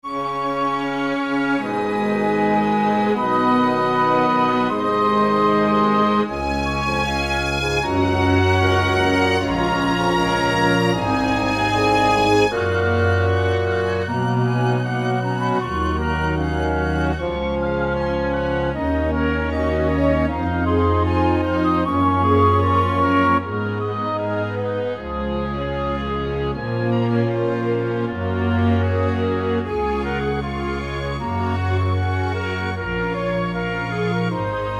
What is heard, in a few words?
Symphony Adagio; Lost Childrens Symphony